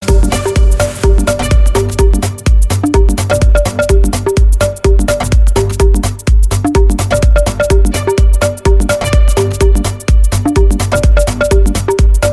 minimal, electronic ringtone

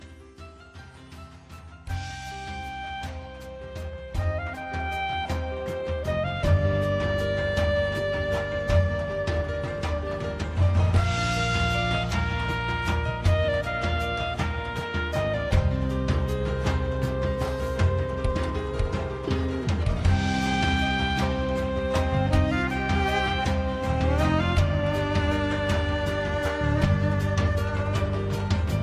saz; Game of Thrones

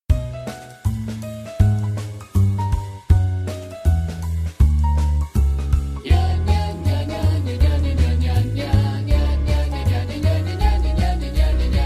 jazz, ringtone

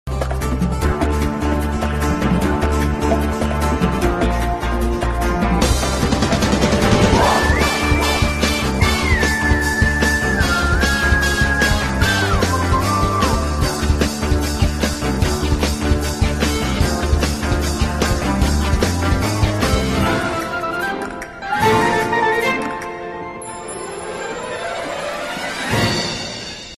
Background

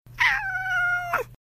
Long Meow; Peanut

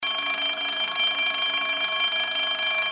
Alarm

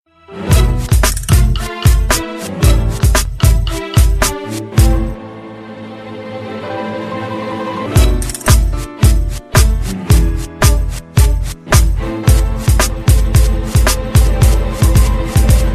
sad tango; ringtones

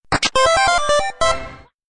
Item Get

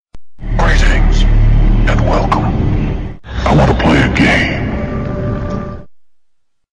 Saw Sms Alarm

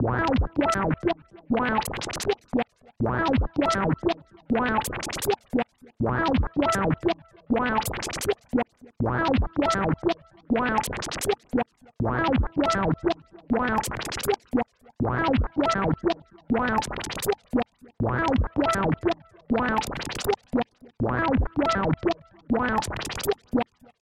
Circuit Tone

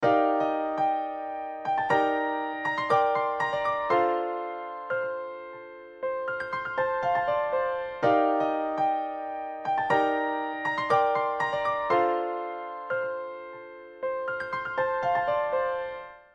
Pretty Piano

